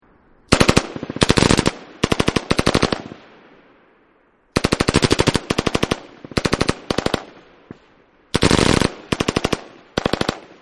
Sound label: M249 Machine Gun Long Distance Burst; Machine Guns; VRSonic